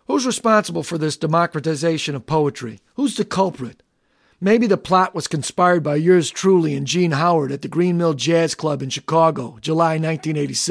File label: beat remnants